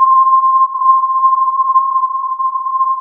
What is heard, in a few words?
filtered input